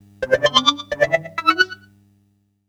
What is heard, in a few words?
NRG SFX, Trance